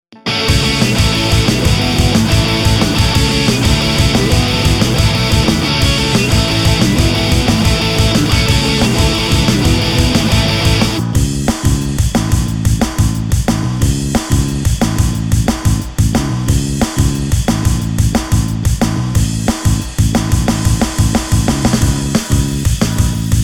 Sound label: instrumental